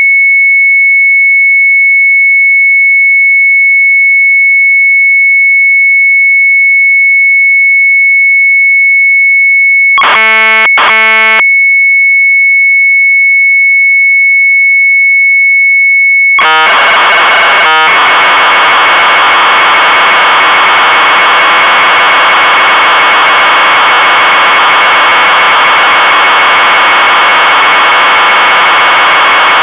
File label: MZ800 Tape, sharp; retromelsarchive